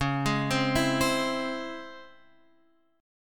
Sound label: Db Minor Major 7th Db down Guitar Open F; arpeggiated